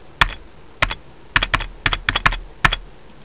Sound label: keyboard